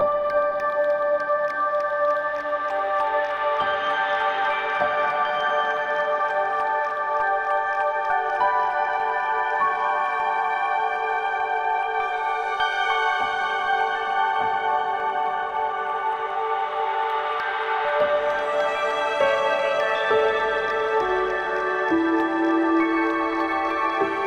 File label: Ambient; NWNDS; Music